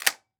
Weapon Gun Shotgun 12 Gauge Benelli Automatic Dry Fire; AdobeCC